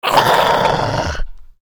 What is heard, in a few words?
Diremole Death